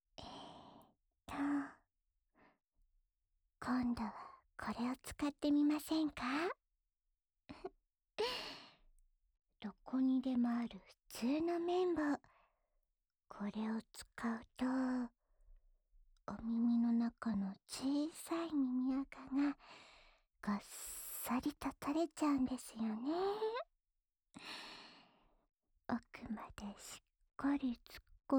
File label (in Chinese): 棉棒掏耳（右耳）